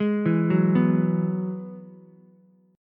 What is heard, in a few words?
G sharp Minor 7th Sharp 5th, Ukulele; arpeggiated; 1,4,2,2